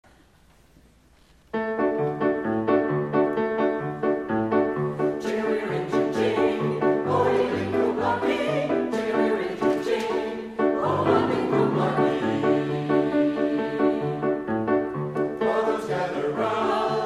Anthem

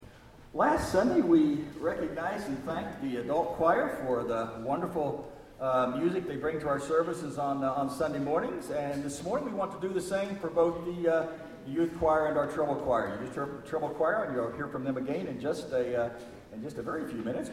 treble and youth choir

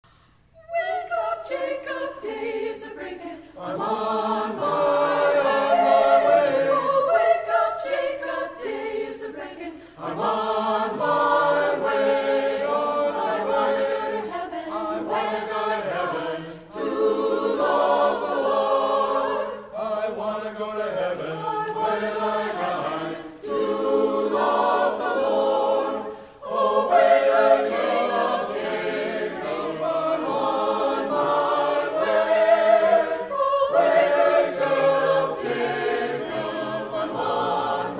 Anthem Wake Up Jacob trad spiritual

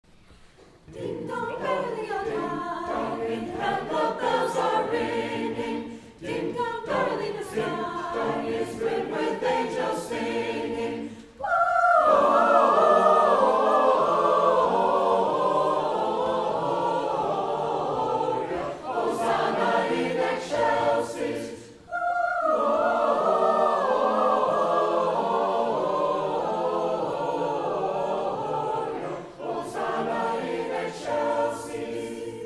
Ding Dong Merrily On High, 16th Century English

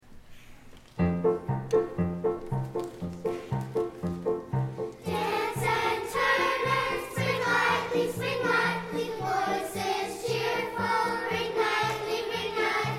Treble Choir Dance And Turn; Outreach Collection Music

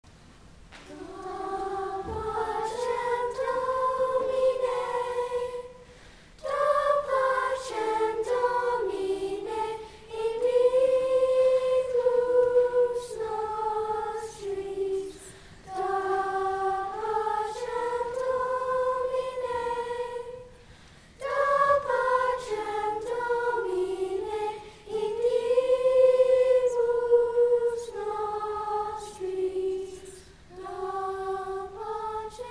Treble Choir Prelude Da Pacem Domine; Franck arr Goetze

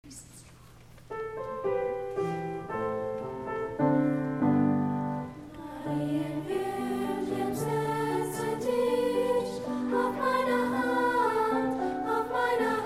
Treble Choir Prelude; Marienwurmchen (Ladybug) (Johannes Brahms)

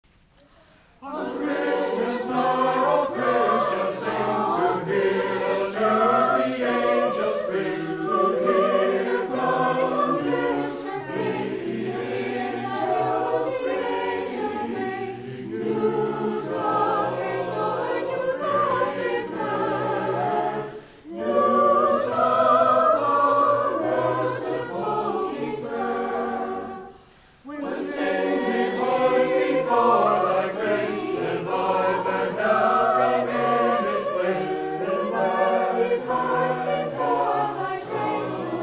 Song Sussex Carol, Traditional English

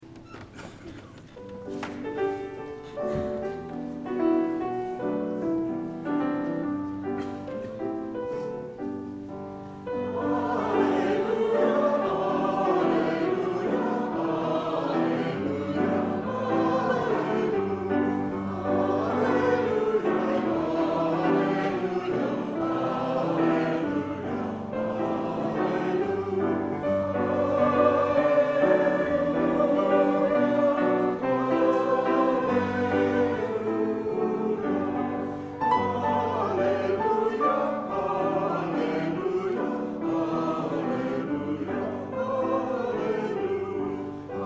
jazz alleluia

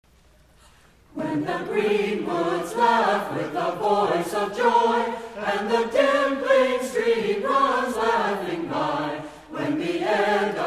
Laughing Song

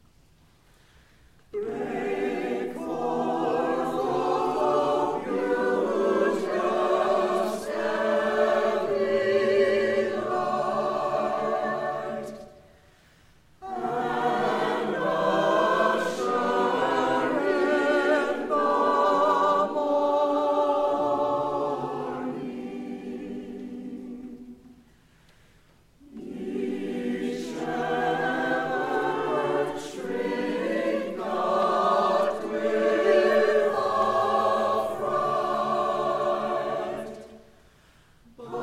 anthem

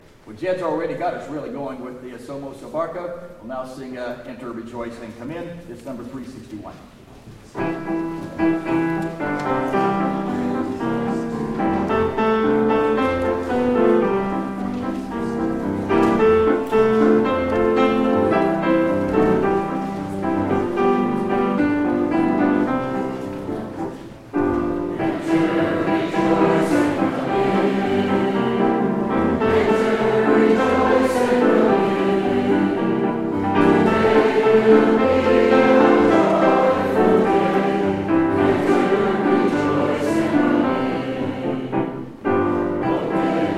hymn

